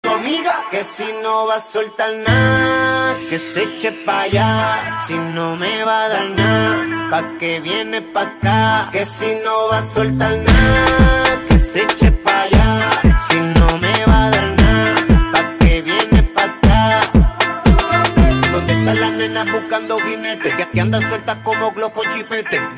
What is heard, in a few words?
Dembow Y Reggaeton